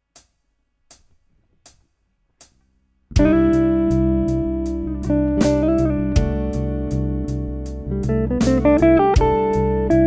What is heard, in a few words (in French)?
do lydien improvisation